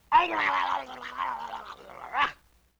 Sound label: LoFi Synthetic Squawk; Vintage Cartoon; Comedy Vocals